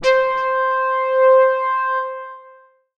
LEAD - C, Synth One Shots

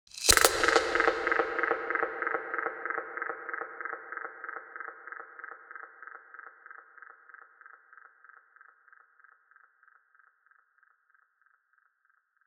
Astral Perc 142 BPM; Drums & Percs; Percussion

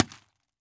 impact squeeky, Cartoon Film Effects

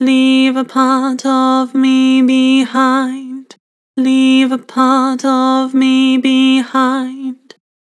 Vibrato Leave a part of me, Harmor Vocals

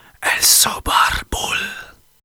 Battle Cry, Viking Vocal, Un-Processed; Viking Vocal Builder; Battle Cries & Phrases

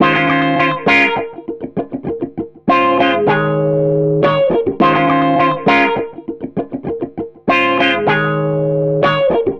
Xmass Guitar Loops 100bpm BbMin; Guitars; Ghosthack; Advent Calendar 2019

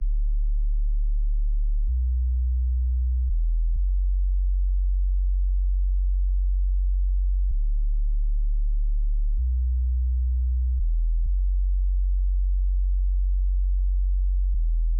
128 BPM F# Min Sub; House; Loop Stems; Cymatics; Breakdown Loops; Mothership

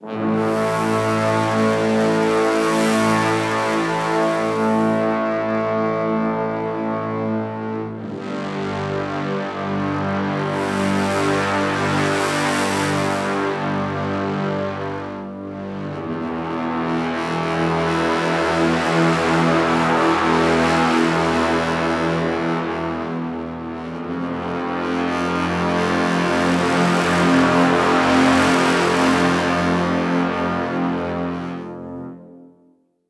Low Brass - Am 120BPM; Construction Kits; STEMS; Ghosthack; SkyRealm; Kit 09 - Shallow Valley

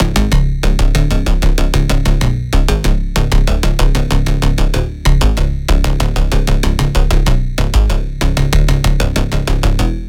Bass Loop C#m 95BPM; Dubstep; Mission; Ghosthack; Advent Calendar 2022

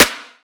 Clappy Snare - B; House; Drum One Shots; Snares

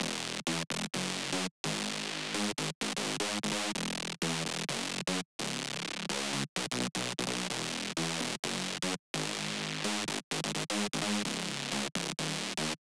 128 BPM G# Min Bass; Drop Loops; Loop Stems; Cymatics; Take Me Away

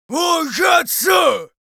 Yells, Viking Vocal

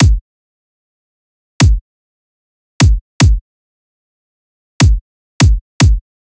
Dubstep Drum Loop Kick, 150bpm; Stem Seperated

